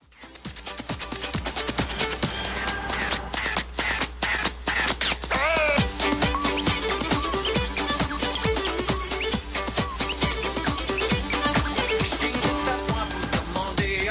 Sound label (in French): Swing